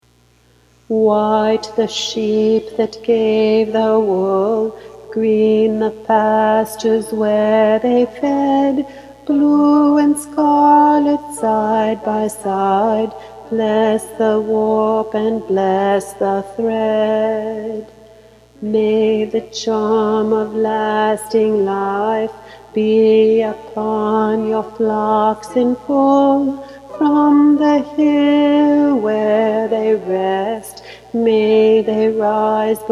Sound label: a winter charm tenor